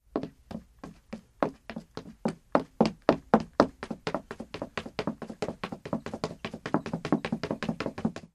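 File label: Footsteps Female Run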